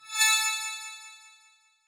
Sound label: light whoosh